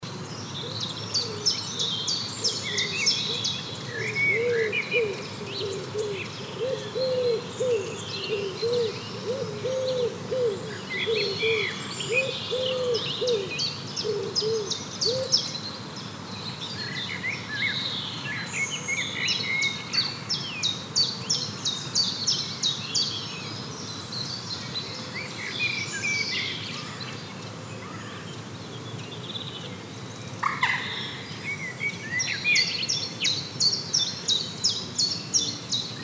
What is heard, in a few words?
birds different meadow light breeze